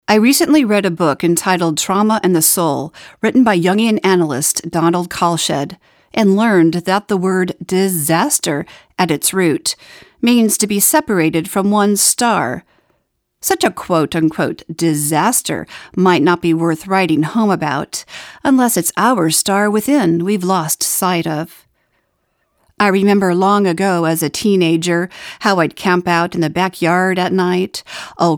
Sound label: singing stars